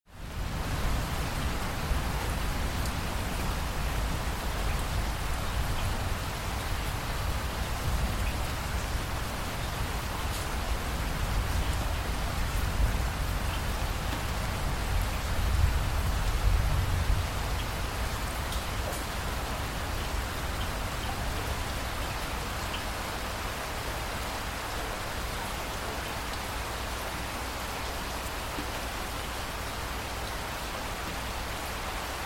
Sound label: rain chicago; gesource